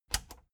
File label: plastic button latch scratch enable fast